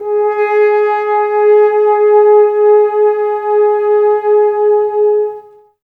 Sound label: G#, Brass, F.Horns, Woodwind Brass Ensemble; Sample CDs; Miroslav Vitous